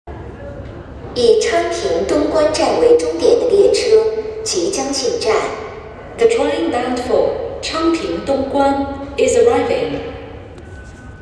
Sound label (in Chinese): 昌平线 昌平东关 终点